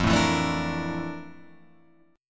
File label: F Major 13th F down Guitar Open F; strummed; JGuitar